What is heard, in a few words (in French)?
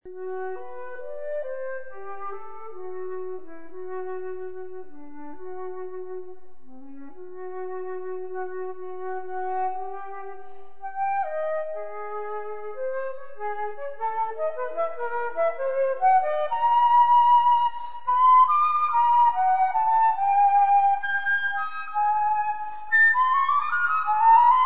Flute Seule